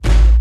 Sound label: Laser Blaster Hit